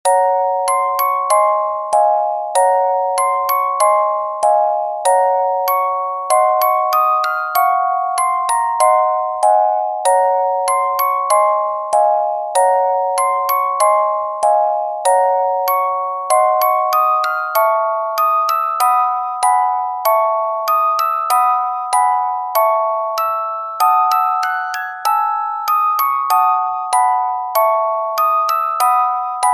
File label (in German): orgel